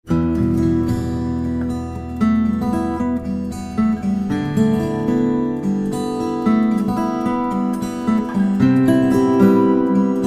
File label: acoustic duo